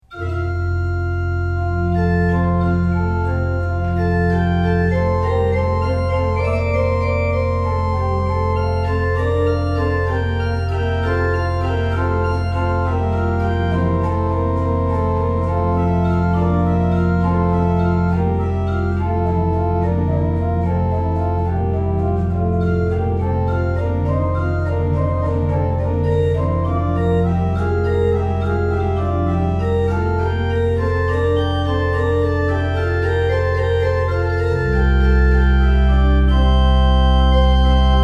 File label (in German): Klassik Orgel in Kirche